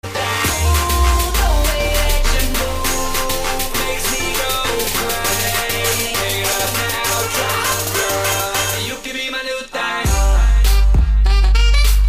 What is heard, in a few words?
bass boosted; xtr3m3 fl00d3r